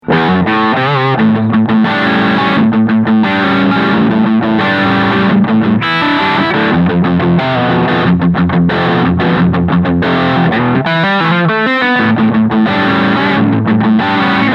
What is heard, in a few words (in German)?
Hornet 30i Beispiel; Mooer